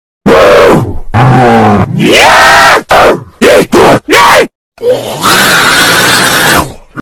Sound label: loud zombies screams